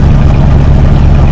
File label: buick; Mafie 1